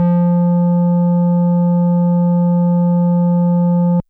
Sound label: FM Sq Dist DX100 F2; square